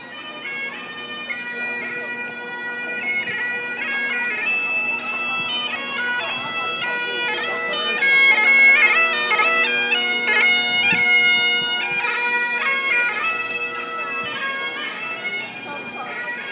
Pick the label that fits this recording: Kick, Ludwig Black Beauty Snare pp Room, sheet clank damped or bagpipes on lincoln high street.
bagpipes on lincoln high street